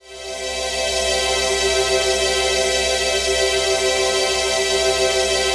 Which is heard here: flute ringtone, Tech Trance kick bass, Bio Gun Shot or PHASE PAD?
PHASE PAD